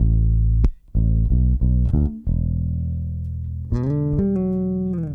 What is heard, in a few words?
bass, analog funk; Mixed Berry Jam; Sonic Foundry (Sony Creative Software) - What it Is! 70s Analog Funk